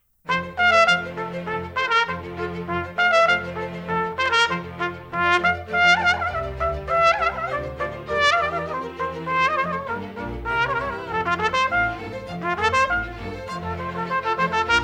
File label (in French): Concerto pour Flute a Bec; VIVALDI; RV 443